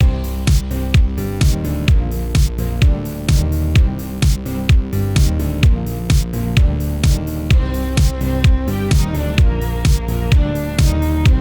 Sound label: Cello Music